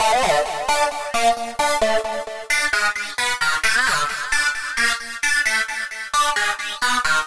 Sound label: Lead Synth